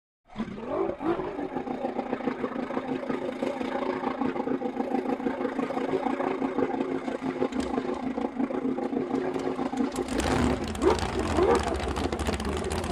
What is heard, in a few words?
Drag Boat Start revs